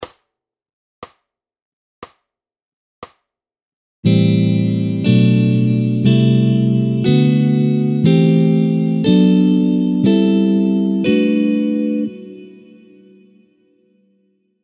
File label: am7 drop 2, guitare jazz